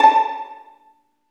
String Vlns Pizz

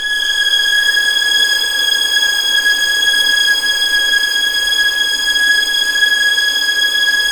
G#6, String Collection; East West; The Ultimate String Collection

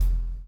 CHAIN; Spectrasonics - Backbeat

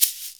SHK1, SHAKER1; Univers Sons; Basicussions

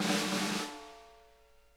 snare; Sonic Reality; Interactive Drum Kits

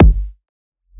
Kick, Dance Drum Kit

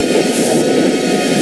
SPEEDER; STARS FXS; East West; Global Sounds

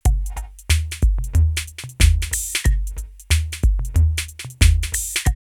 808 Chill Out 92, drumloops, DrumMachines; X-Static Goldmine

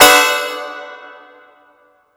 chords

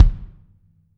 R B Kick Close, drums, One Shots; Sonic Foundry; Sony Creative Software; Drums from the Big Room The Mixes; R B Kicks

